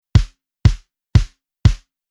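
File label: Rhythm Disco Bass Drum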